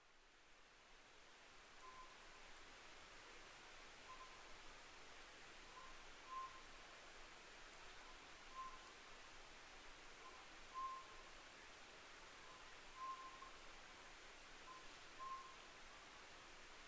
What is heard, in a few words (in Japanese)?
konohazuku